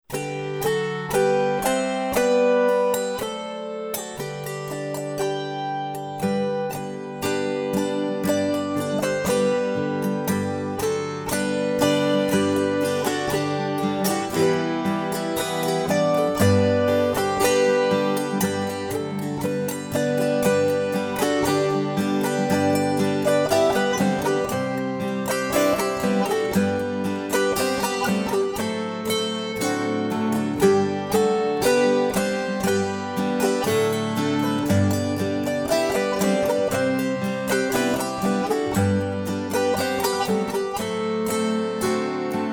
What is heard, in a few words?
autoharp fragment